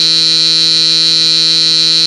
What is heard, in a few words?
buzz; soundbible